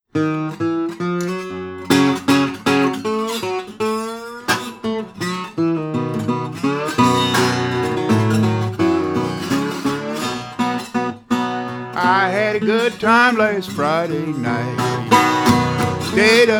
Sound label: Folk Radio